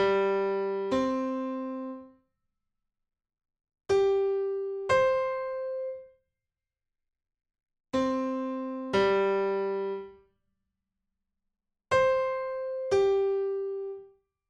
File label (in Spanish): Intervalo cuarta justa